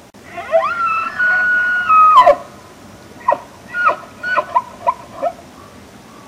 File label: elk